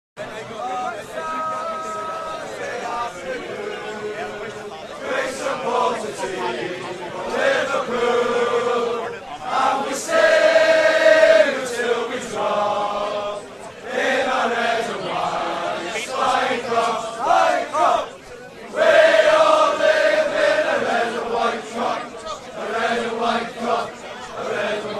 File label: Chant; Lfc